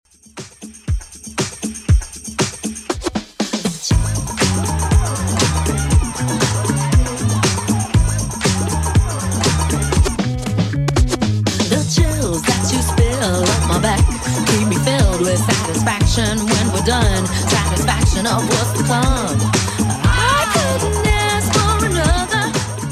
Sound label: 90s Redrum 118bpm